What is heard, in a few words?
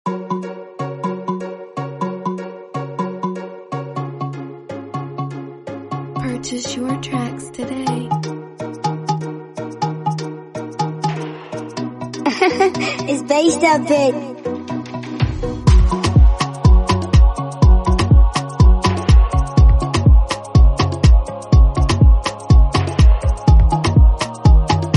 Free beat Type Beat